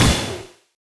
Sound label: electro impact, weapons